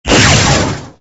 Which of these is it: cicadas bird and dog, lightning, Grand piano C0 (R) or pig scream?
lightning